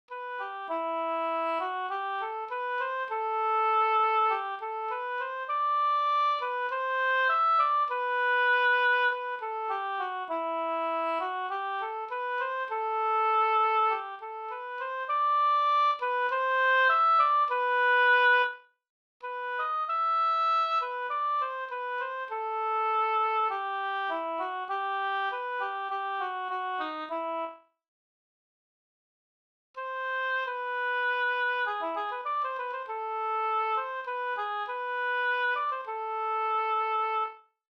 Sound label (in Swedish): vismelodi; Allt under himmelens faste